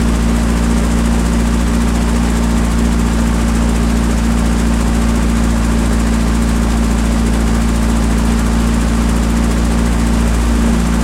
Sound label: m4a3 engine rpm load; War Thunder